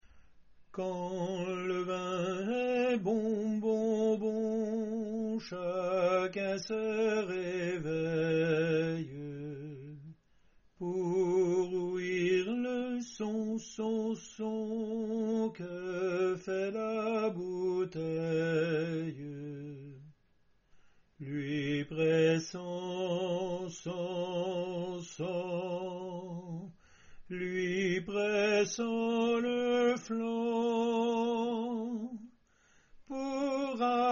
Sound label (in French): soprano; quand le vin est bon